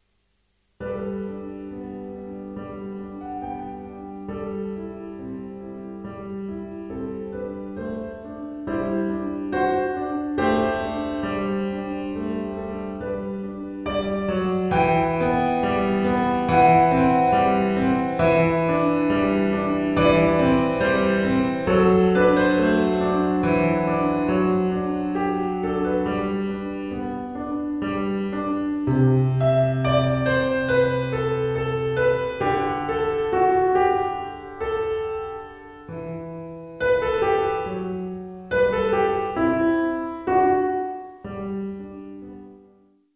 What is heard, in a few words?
piano pop